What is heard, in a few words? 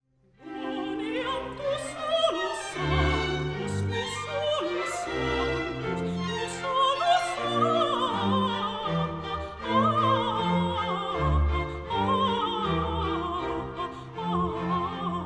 Quoniam coloratura